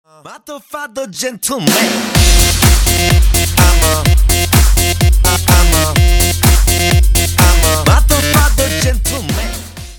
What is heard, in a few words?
Gentleman Buzzer